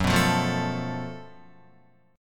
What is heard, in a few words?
Gb Minor Major 7th Double Flat 5th down Guitar; strummed; JGuitar